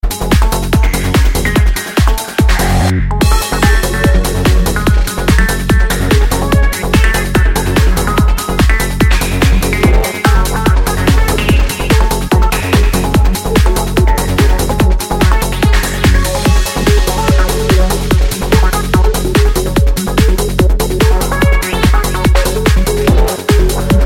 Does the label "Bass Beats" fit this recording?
Yes